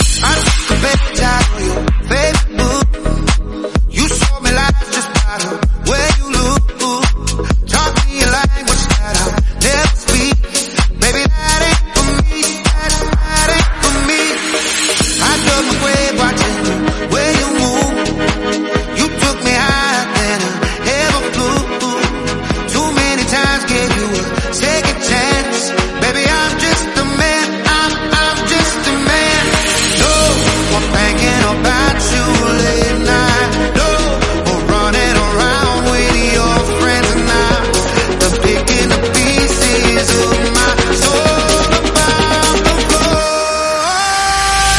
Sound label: 128bpm Ebm